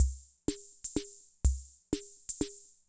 Loop, classic machines; arranger

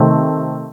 chord

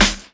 KIT, Dance Mega Drums, HIP; Best Service

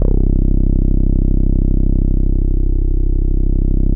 Boom da Bass; E-MU Producer Series Vol. 6 – Analogia Project

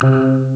B synth; ST-XX Amiga Soundtracker Sample Packs

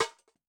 SEMI LOOP; Yamaha DTXpress-II

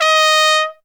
TRUMPET FX; Zero-G; Phantom Horns

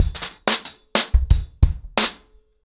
ACOUSTIC DRUMS, 90 BPM

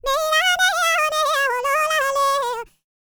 BALKAN, World Voices; ILIO; Vocal Planet

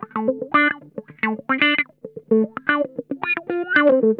WORMY, Funk Guitar; Zero G - Funk Guitar

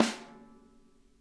snare, drum kits

